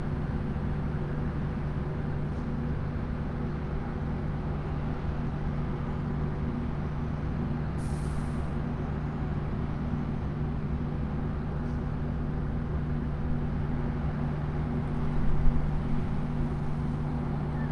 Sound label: industrial day loud hum birds forklift horn and drive bys; fvtt sonniss gdc audio